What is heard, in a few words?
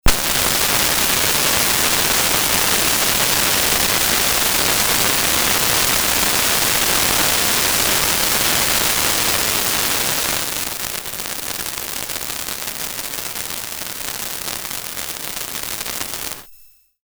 Metasonix D 2000 Gated Noise Tone